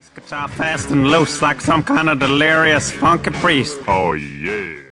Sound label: slappin the bass fast and loose